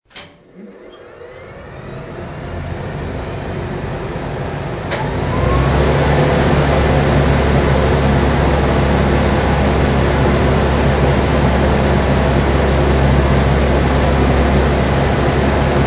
blower, pampanito

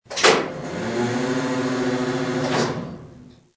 lube oil transfer, pampanito

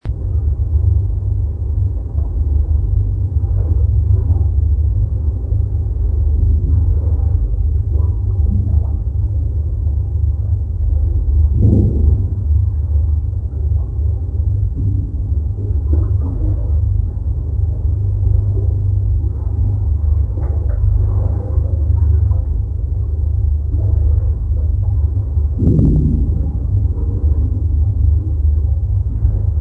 water tube, pampanito

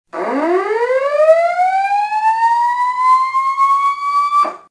collision alarm

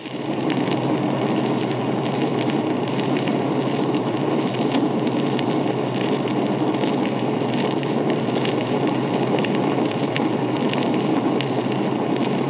Trim Pump

